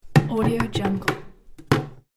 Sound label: bucket fall